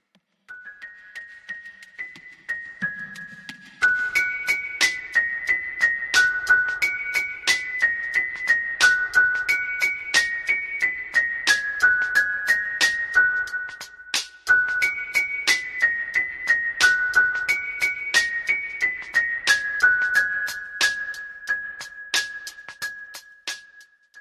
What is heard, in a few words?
toy; msx